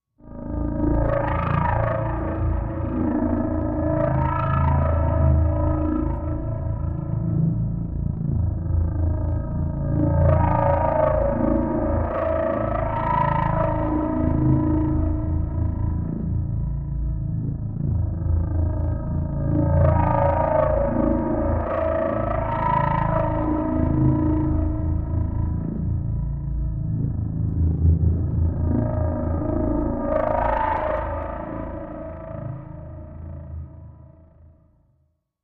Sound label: Spent Rods Machine Amb